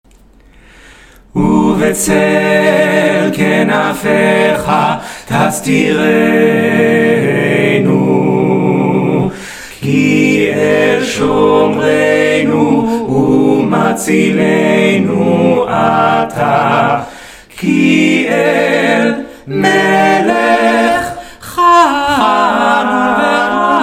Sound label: all voices; Uv'tzel